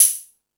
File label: Hip Hop, Drum Machines